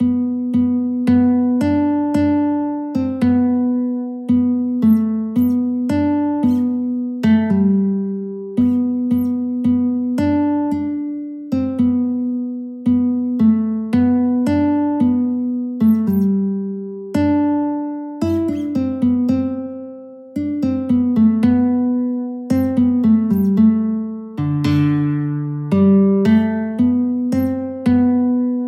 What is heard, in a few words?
angels we have heard on high, christmas guitar